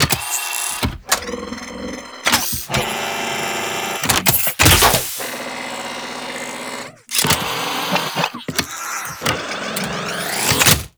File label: Foundry Segment Insert; Liset Machines; Tenno